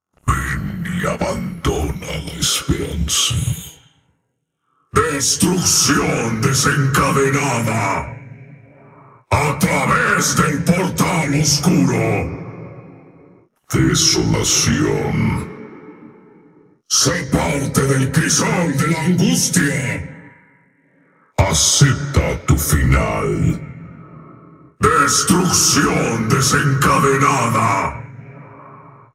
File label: vocals